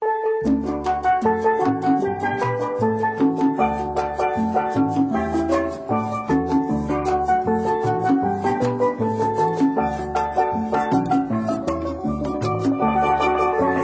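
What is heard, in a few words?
Music Ambient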